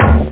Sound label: thunk; ericschwartz; qualitytime